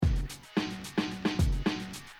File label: drum loop